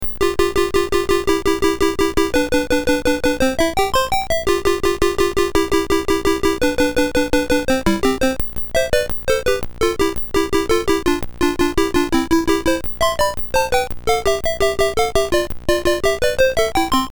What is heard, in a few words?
Chopsticks, Music Keyboard; WaveMakers